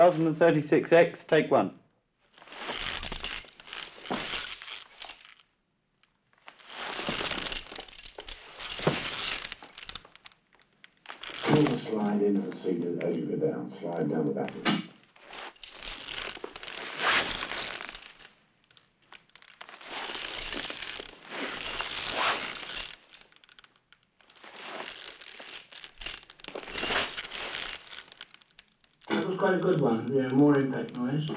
Foley Saddle Leather And Chains

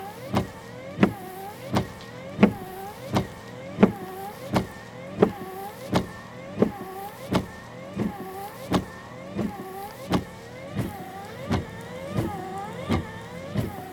Loopable Car Wipers

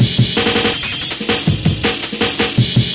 Amen Tramen Break

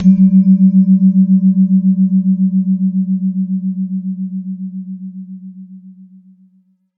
Jegog

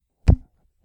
Punch

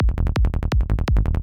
Kick And Bass Loop 167bpm

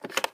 Telephone Pick Up

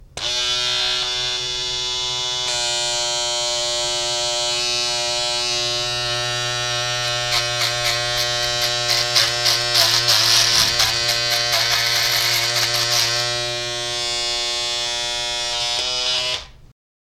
Electric Razor

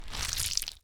Slime

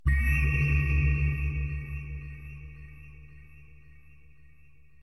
Spooky Zone

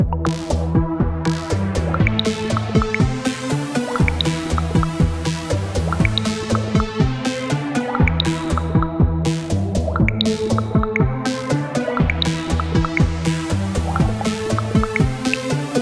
Dropy Loop

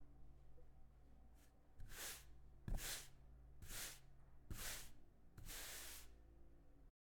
Felt Brushing

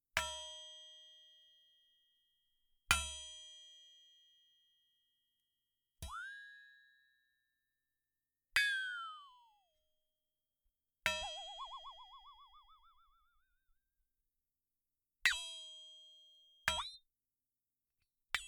Flexitone

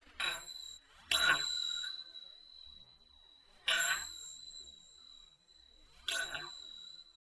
Shines

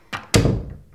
Door Close

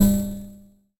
Stab

